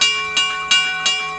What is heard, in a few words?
bridge signal; Mafie 1